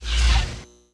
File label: mod laser welder